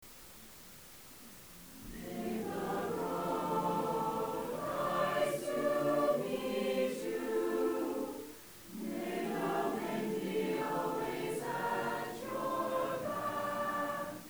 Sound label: chorus end